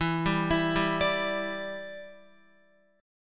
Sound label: E Minor 7th, down, Guitar; arpeggiated